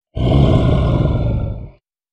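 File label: werewolf growl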